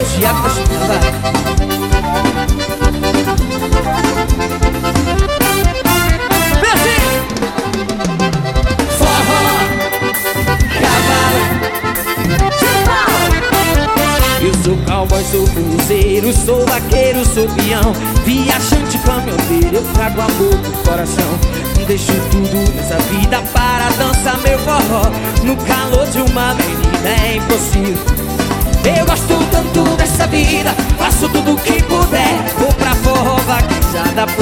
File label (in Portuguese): forrozeiro